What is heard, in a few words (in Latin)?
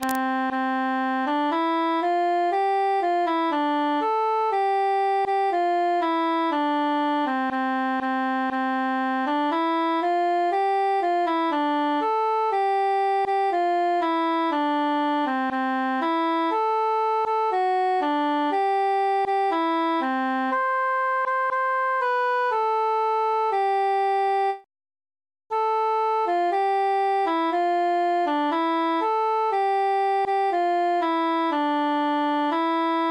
cantus solo; since first i saw